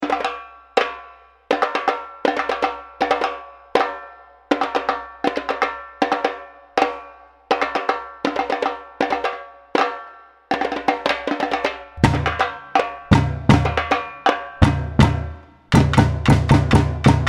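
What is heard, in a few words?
break, samba; Pirate Samba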